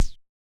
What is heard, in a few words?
Yamaha DJX 2B, F# Pulse & Noise